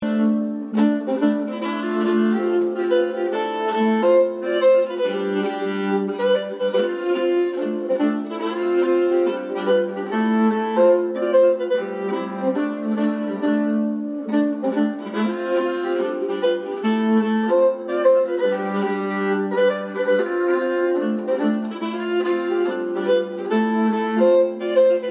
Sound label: Harjedal schottische